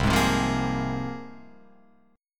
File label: C 7th Suspended 4th Sharp 5th C Guitar Open F; C7sus4#5 strummed